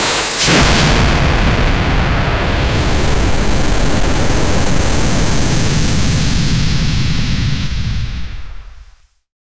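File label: explosive fiery aura burst; energy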